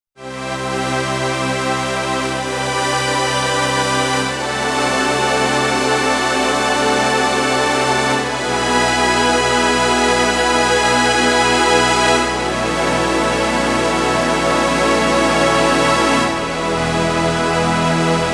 Pad Paradise

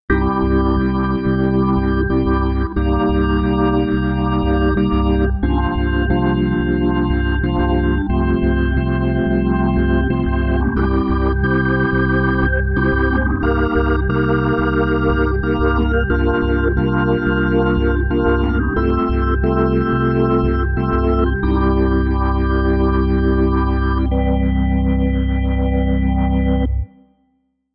Blues n Gospel, Organ

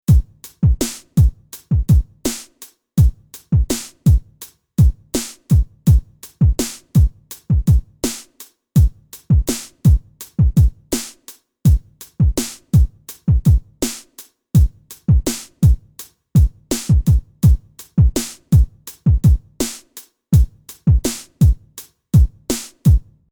Electric Kit; SP4; Drum

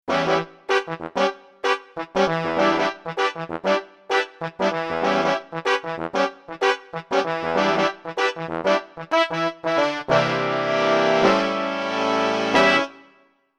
P Funk Horns; PC3

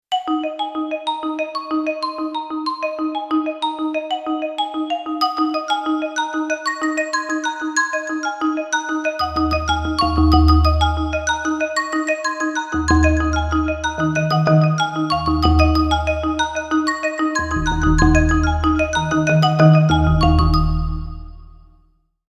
Solo Marimba; PC3

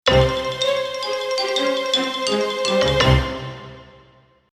Perc Atk Strings; PC3

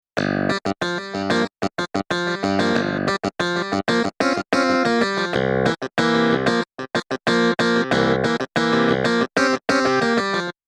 Clav; Joes Clav; SP4

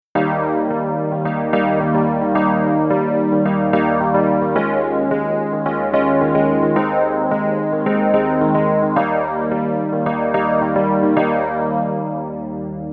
Wurl; E Pianos; PC3

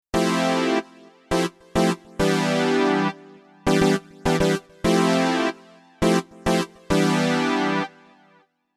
Classic Comp, Synth; SP4

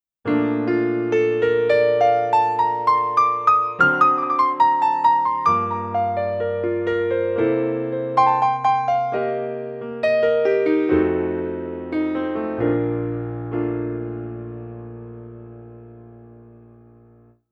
Jazz Piano; Pianos; MP10

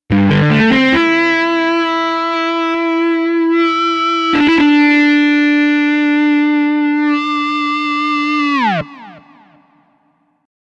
Rockin Lead, Guitars; PC3

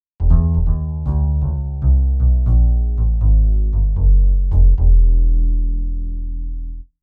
Upright Bass

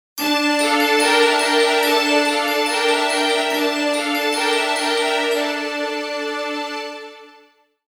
Strings and Silver, Orchestral; PC3

